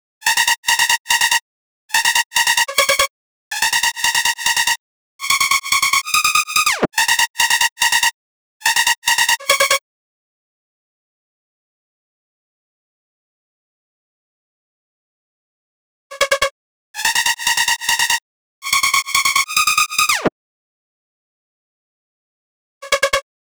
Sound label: 143 BPM D# Min Lead; Melody Loops; Loop Stems; Cymatics; Hyperbass Pt 2